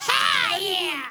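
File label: FEMALE RAVE; Spectrasonics; Heart of Africa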